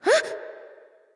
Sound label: nv breath; smite; awilix